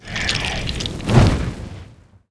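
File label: skeleton mage attack; monster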